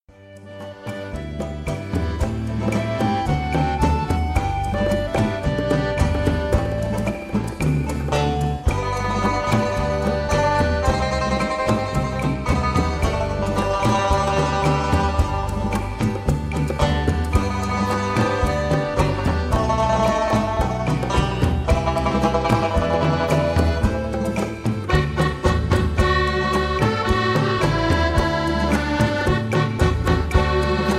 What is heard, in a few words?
box and banjo band